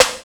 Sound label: CRY SNARE; Drums In Your FACE!